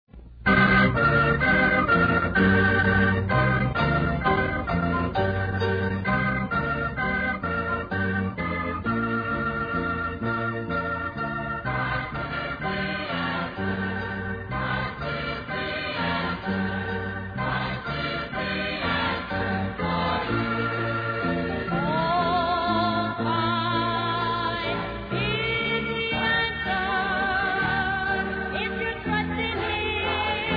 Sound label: radio theme song